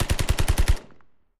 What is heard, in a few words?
LIGHT MACHINE GUN BURST; bursts